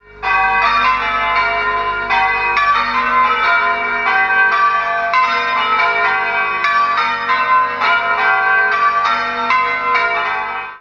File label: church bells; schattenlander